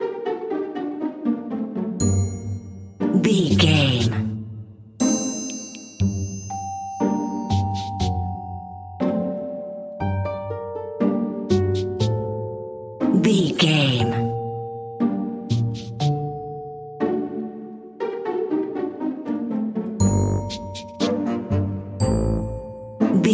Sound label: sneaky tiptoeing narrative